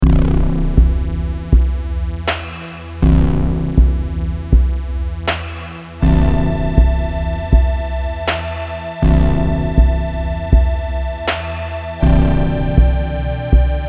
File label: beat